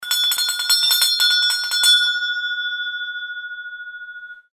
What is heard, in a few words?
bell